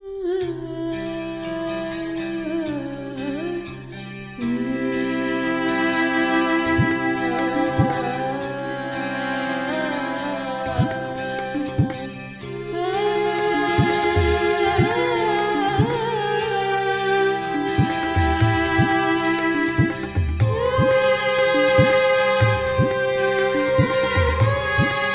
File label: Ethnic Rock Epic